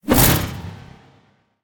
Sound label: fire; Smite; Vamana